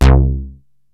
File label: MOOG; Rubber Chicken Software Sample Bank